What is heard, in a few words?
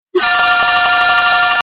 ringer classic phone